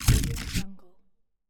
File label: game heavy biomechanical sound